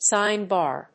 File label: sine bar